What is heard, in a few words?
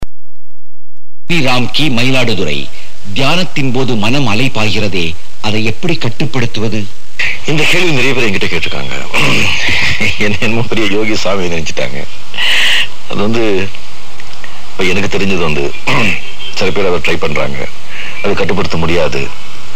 meditation